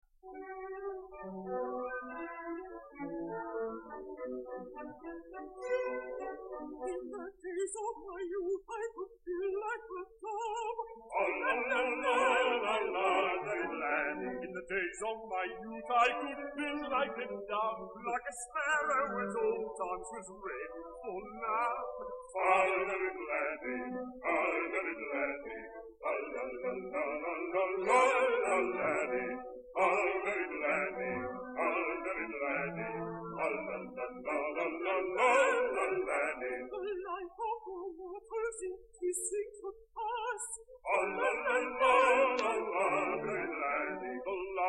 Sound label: air